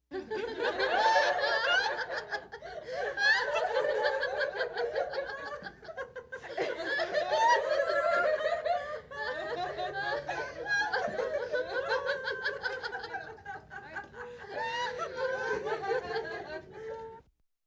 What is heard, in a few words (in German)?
Lachen Lachende Frauen